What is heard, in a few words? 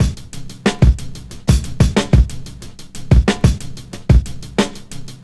Drum loops Boombap